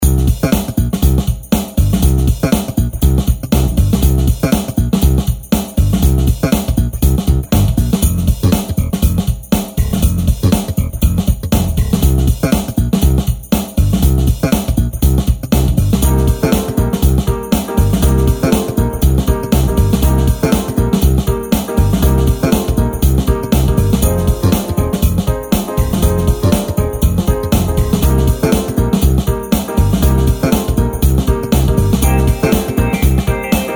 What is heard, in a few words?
acoustic; maoudamashii